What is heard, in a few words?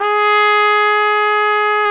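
Trumpet mf, Solo Brass; AKG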